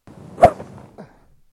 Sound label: hit; waluigi